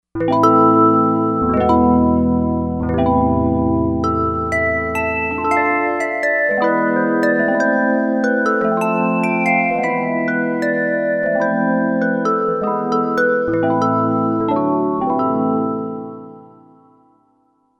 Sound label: Stone Harp